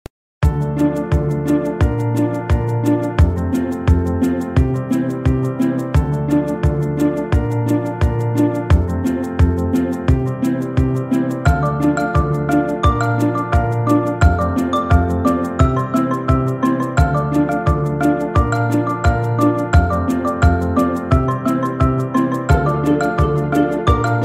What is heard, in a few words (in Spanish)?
Música de Fondo